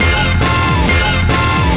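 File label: 136 bpm horny horns; HORN LOOPS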